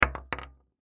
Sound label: Bullet Shell; Bullet Shell 12 Gauge Drop Wood; Drops (Sound Effects:Objects); Blastwave; Warfare